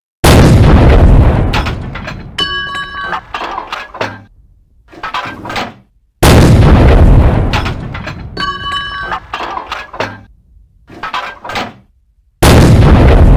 artiller

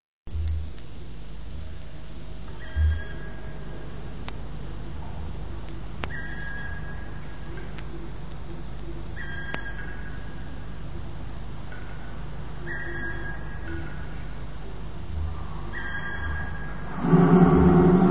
prizrak

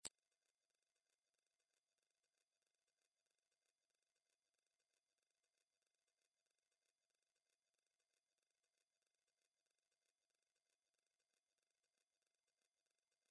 ultrazvuk dlya otpugivaniya koshek

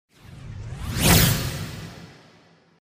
zvezda padaet

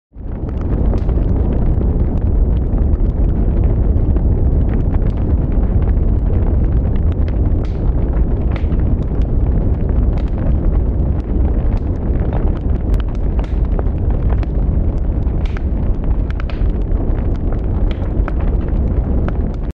zvuk plameni

